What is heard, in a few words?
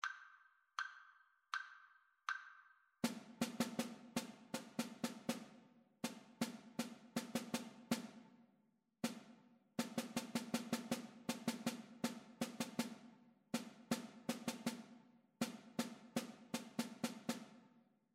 rhythmic dictation; ear training